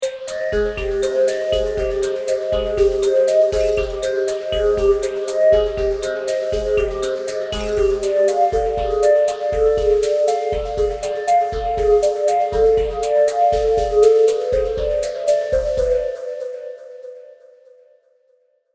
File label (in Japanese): ミステリー・ホラー・探索パート｜不穏な感じムンムン